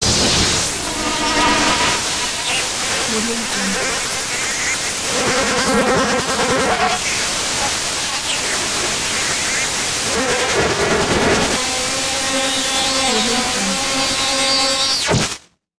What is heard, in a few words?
energy synth discharge on off; soundmorph